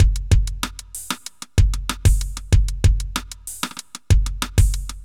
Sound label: kit